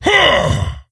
Attack; Kologarn

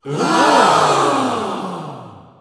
Audience Reaction; Barnes The Stage Manager

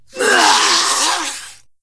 Wound Crit; Ionar

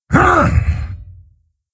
Attack, Creature; Steelbreaker

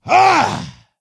attack; Skadi The Ruthless

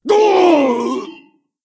Wound Crit; Stormcaller Brundir

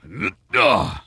Attack Light; Putress

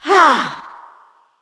Attack Heavy, Creature; Eydis

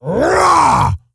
Stonebark Attack; Elder Stonebark

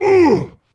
Wound; Captain Rupert

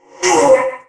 Wound; Deathbringer Saurfang

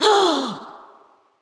Creature Wound Light; Fjola

